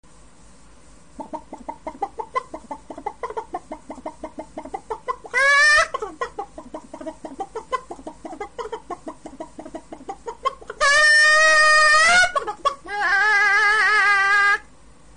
Lone Rooster

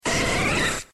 Jolteon cry

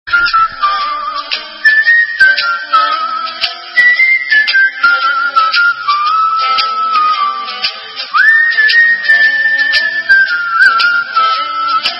Whistling Tune

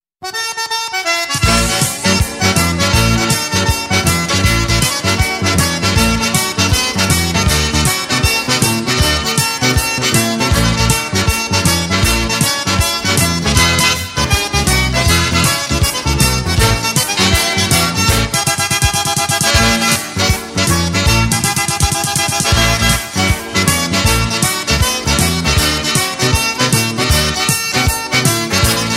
cajun

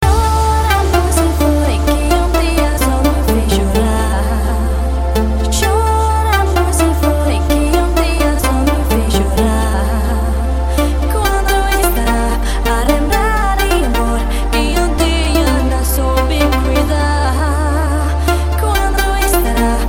Livemix

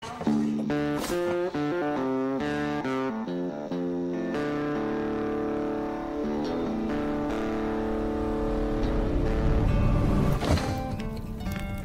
Riff

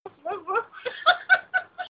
Terrible Laugh

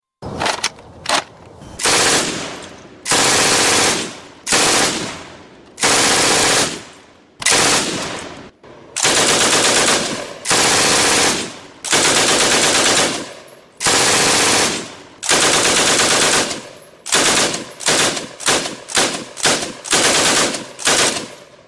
Automatic Gun

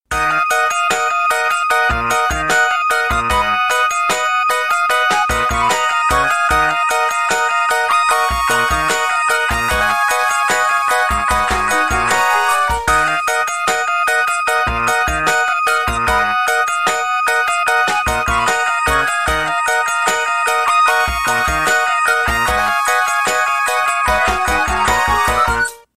Groove

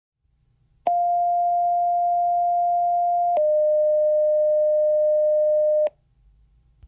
Fire Tone

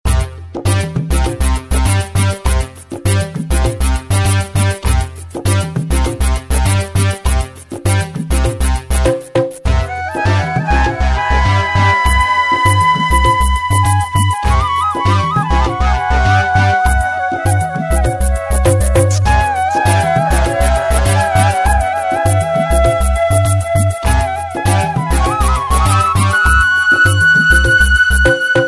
Fusion Ringtone

